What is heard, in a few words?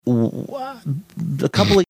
odd noises snorting